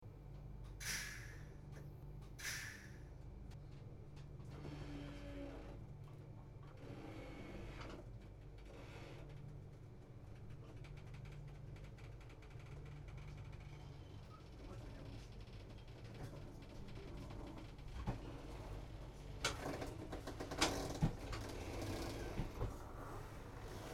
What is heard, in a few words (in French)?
train de nuit; ICN4751; Luxembourg; Marseille